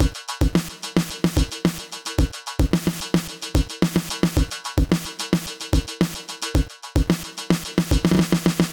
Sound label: 110 Beat, Drum Machines; Trax Chemical; Ableton